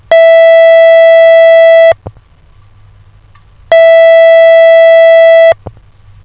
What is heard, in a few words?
tone